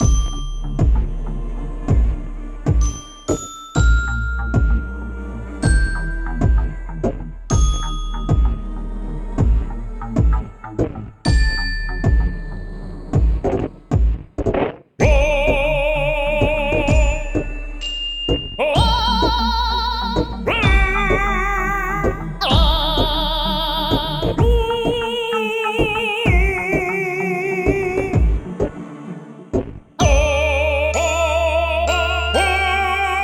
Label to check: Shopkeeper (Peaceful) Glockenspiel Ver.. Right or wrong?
Right